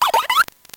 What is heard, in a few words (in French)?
Cri